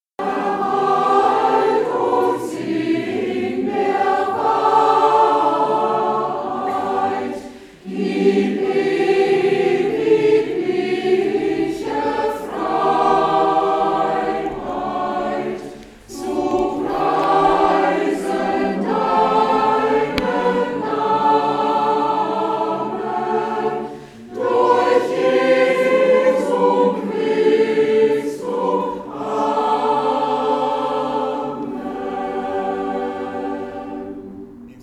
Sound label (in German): gesang